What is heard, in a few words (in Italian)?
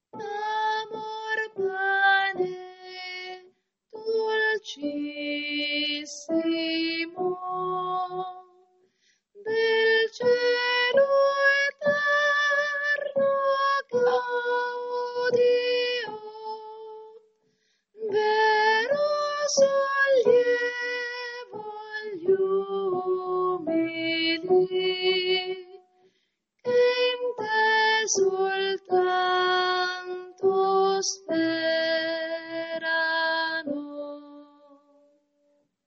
soprani